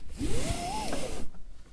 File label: safety belt; 拉安全带